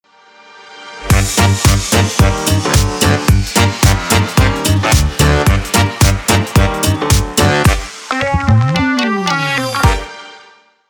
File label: short electro swing jingle